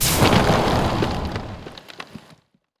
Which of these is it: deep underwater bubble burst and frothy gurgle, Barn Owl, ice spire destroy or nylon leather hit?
ice spire destroy